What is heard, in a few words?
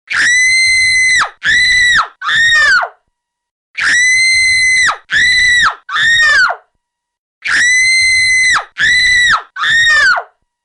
Scream